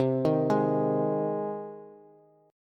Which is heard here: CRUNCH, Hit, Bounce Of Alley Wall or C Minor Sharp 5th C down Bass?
C Minor Sharp 5th C down Bass